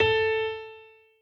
piano echo

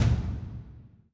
drums

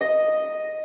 harp

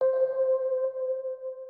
organ

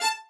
violin

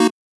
Chord

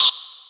vox

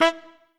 Trumpet melo

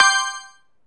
SE GAME CRITICAL PERFECT; maimai

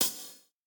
breakbeat

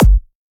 Dr Kick

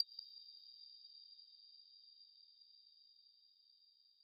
arp

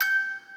musicbox

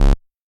bass

